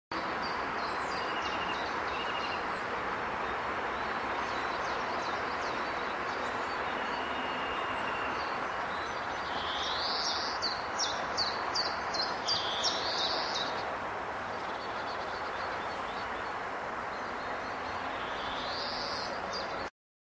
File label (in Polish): dzwoniec; zakole wawerskie